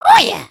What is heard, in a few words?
flex; emotes